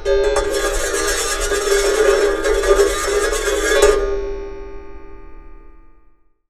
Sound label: Raw, Wire & Tin, contact mic; musicradar; contact mic madness